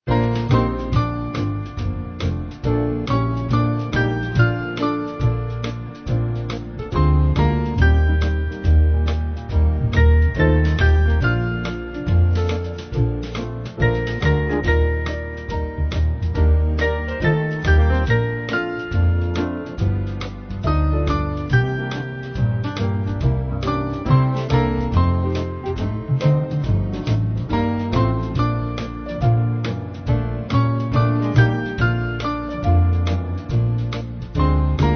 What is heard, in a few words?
Band